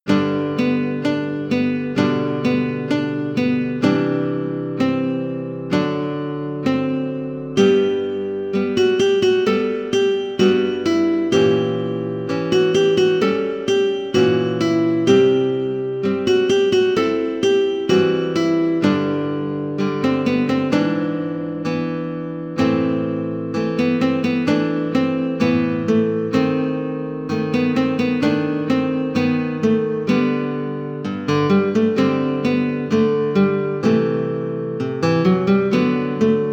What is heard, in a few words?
synth